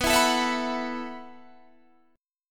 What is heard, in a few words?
E Suspended 2nd Suspended 4th B